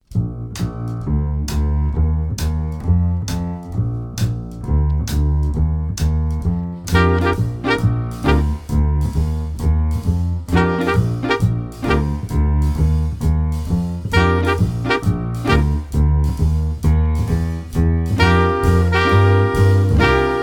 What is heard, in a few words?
Jazz Band